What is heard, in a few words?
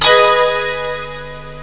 glasses